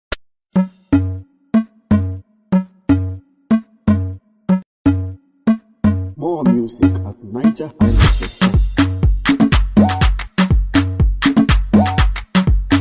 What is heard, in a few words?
Instrumental